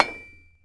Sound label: Hit Metal Spike; Civ4 beyond sword